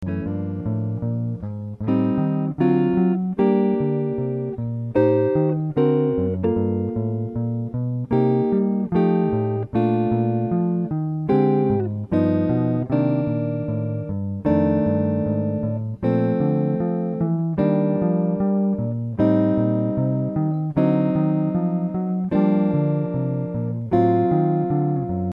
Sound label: chord